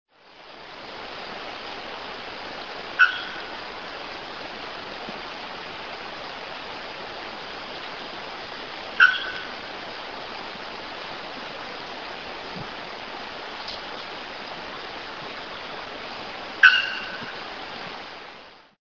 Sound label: Procnias nudicollis Araponga